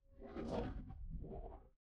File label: magic scifi teleport